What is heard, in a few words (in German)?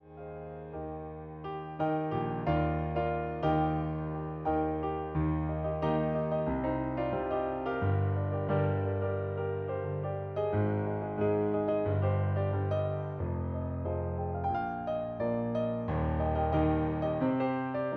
Piano Cover Ballade